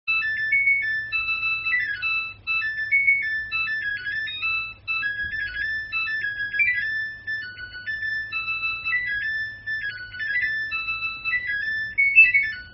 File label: samsung washing machine